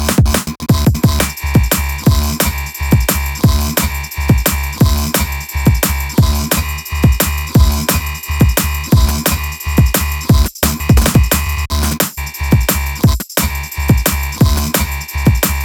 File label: Combat; ZeroVR